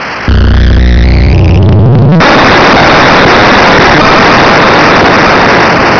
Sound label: strike; Star Strike; The entire planet gets destroyed when you lose this game